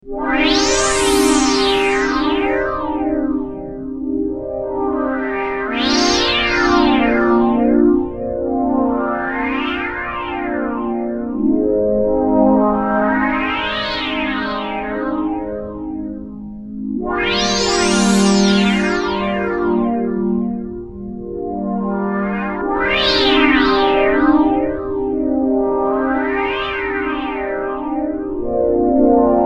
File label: JU-06 pad